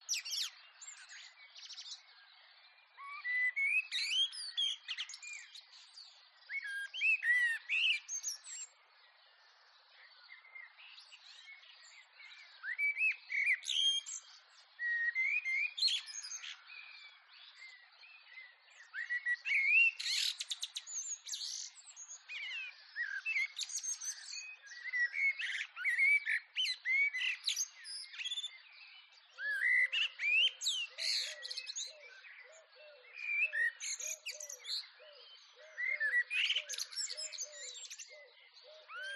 blackbird with other birds in background collared dove dove joins in distance; garden; skyclad sound